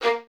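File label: Violins A#3 staccato right; Miroslav Vitous; String Ensembles; 11 Violins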